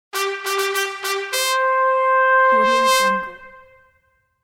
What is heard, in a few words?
short trumpet fanfare with reverb